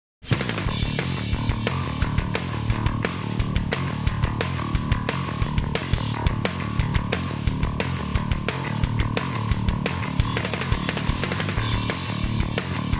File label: drums bass